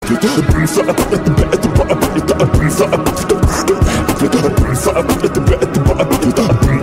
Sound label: beatbox solo; thanos; cartoon beatbox battles